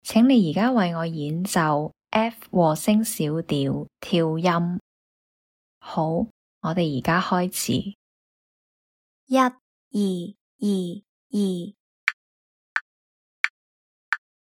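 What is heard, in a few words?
F minor harmonic scale staccato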